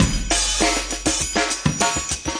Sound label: 100 big clanger, drum loops and breaks; 091 to 100 bpm